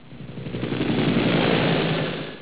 heli

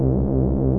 hum

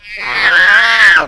death; rogue; stalker

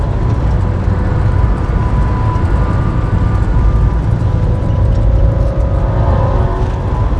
beer ambience; spawngirlsounds

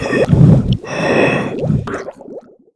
DROWN; opentdm; cobra

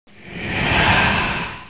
demon spir

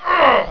pain; ratamahatta

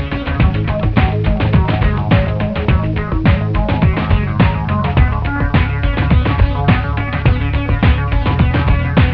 music

